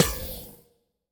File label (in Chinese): 试炼刷怪笼放置声